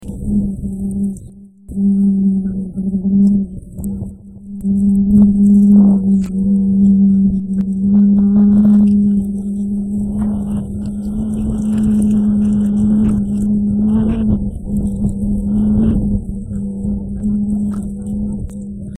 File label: gourds